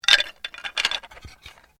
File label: Bones Human Move; Material components for constructing more complex sounds; Tapping; Objects